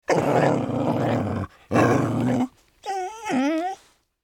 Dog Growl Medium

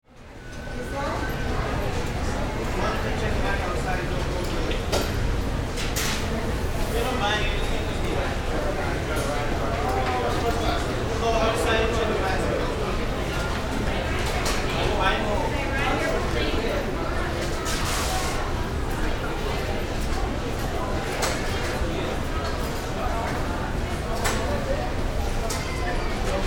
Airport

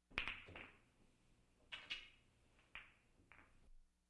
Pool (Billards) Combination Shot

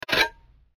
knife scrape

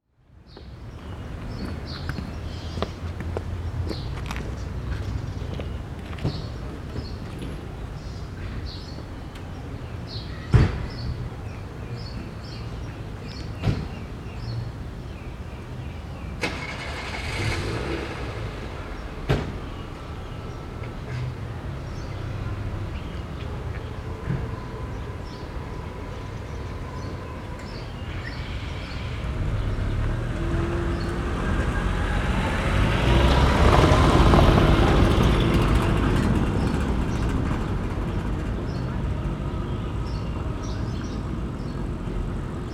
Town

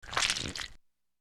Cartoon Accent

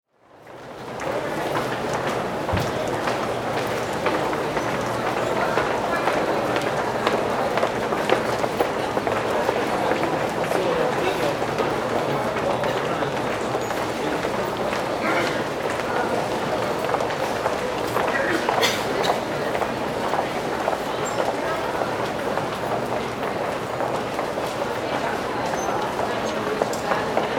Crowded Hall

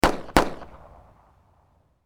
Gun Hand Shot

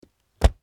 Lid Close

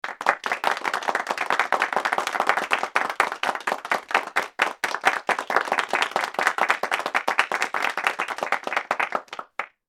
Crowd Applause

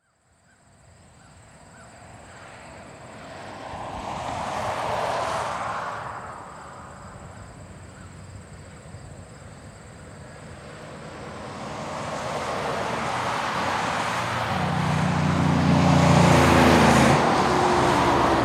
Traffic Highway

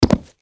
Bodyfall on Concrete

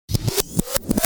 Tape Rewinding

